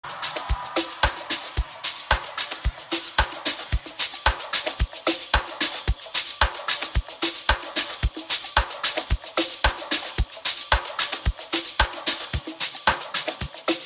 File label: Amapiano Mix